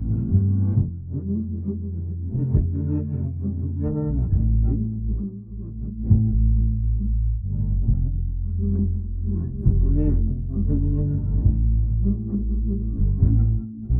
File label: bass; SiSEC11